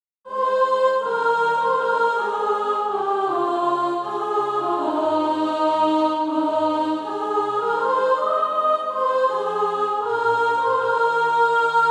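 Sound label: soprano, SATB Practise Music; Each Step of the Way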